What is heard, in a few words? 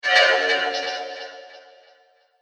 Android Impulse, robot; Robot Sound Effects; Andrew Fleming